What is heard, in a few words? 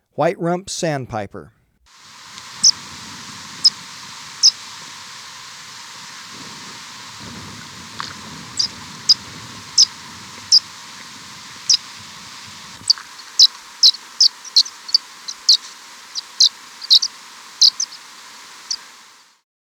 White-Rumped Sandpiper; S. America